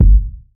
dope kick drum single hit g key, Royality free kick tuned to the G note